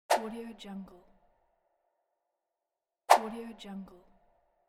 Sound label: whoosh